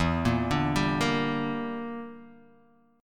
E Minor 7th Flat 5th, Guitar, Half Step Down

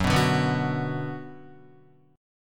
Gb Suspended 4th Sharp 5th, Guitar; strummed; 1,2,2,4,2,2; 4F,4A,5C,5G,5C,6E

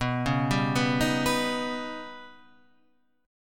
Eb Augmented 7th

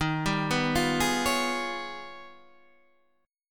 Ab Minor Major 13th

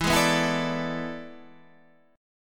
E 7th Add 11th

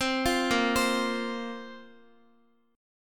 Bb Suspended 2nd Bb down Guitar; Bbsus2 arpeggiated

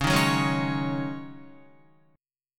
C sharp Suspended 2nd Suspended 4th, Guitar; C#sus2sus4; strummed; 4F,4A,5C,5G,5C,6E; 8,6,6,6,8,9

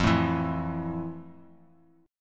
F Major 7th; strummed; chord; FM7; x,8,7,9; JGuitar

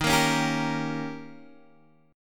D sharp 7th D sharp, Guitar Half Step Down

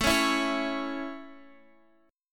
A Major A, Guitar Open F; strummed

